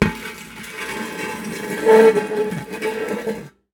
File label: long metal; Sound Design Tools; Raw Foley